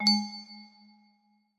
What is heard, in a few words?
music box